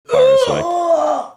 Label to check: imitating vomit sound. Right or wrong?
Right